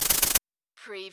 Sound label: CARTOON FAST SHAKER